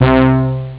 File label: acid trumpet; Amiga Soundtracker Sample Packs